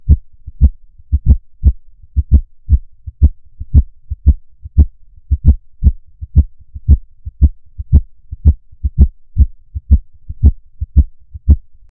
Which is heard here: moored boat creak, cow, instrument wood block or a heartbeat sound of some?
a heartbeat sound of some